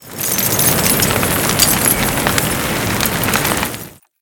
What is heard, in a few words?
rain; Smite; Vulcan